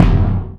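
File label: bass drum, left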